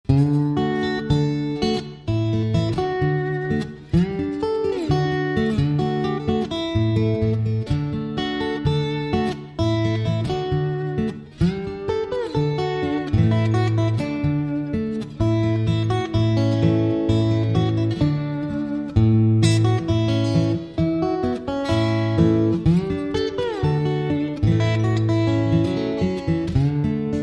Slide Guitar

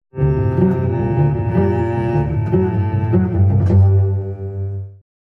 Cello Suite 2 Variation 3

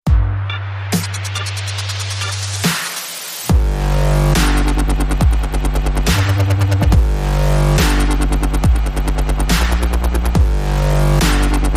Dub, Rhythm

